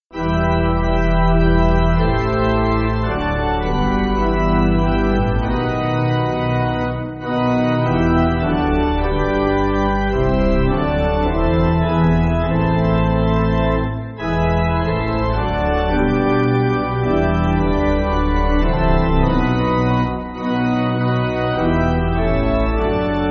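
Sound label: Pipe, Pipe Organ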